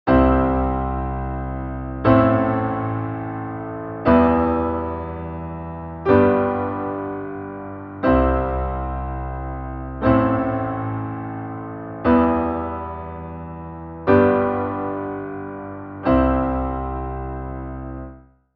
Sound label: I vi iii V